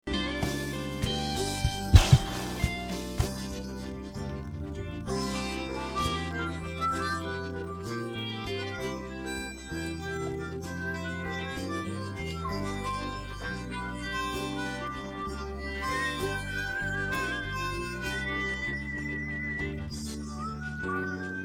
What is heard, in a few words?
no vocals